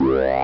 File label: computer electronic sound